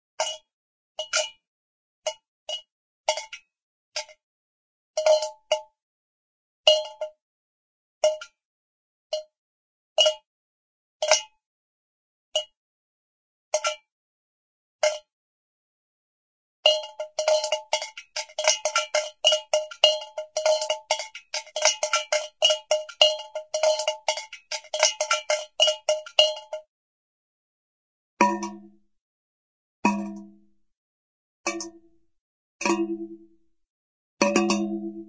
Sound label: water, drip drips in metal pail, light; cartoon